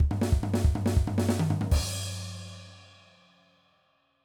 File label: Punk Fill, drum